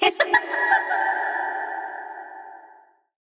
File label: female ghost laugh